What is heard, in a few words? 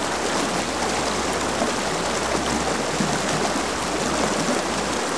rapid; Splashdown; XBOX; Soultree